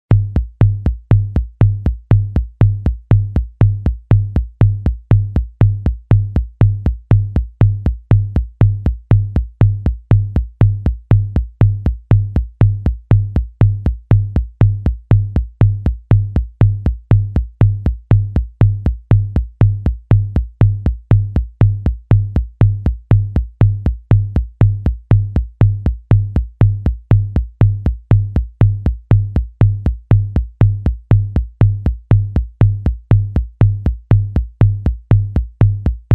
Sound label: Polka rhythm at slow speed